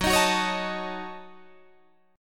Gb Minor 6th Gb Guitar Drop D

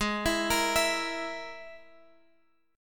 Ab Suspended 2nd Sharp 5th Ab down Guitar Open G

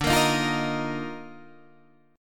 Eb Major 7th Suspended 2nd D

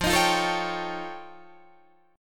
F Augmented 9th F Guitar Open D, chord

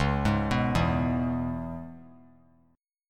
Db Minor 7th Db down, chord; arpeggiated; JGuitar

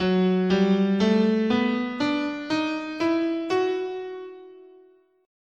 F sharp Symmetrical, scale

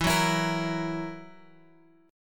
E Suspended 2nd Flat 5th E, chord; Esus2b5 Chord {X 7 4 X 5 6} strummed

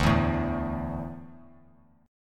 D Suspended 2nd D down, chord; strummed

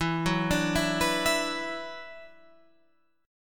E 7th Suspended 2nd, down

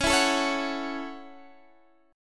A 6th C sharp

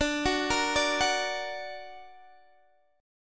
D Augmented, D, down; D+ arpeggiated

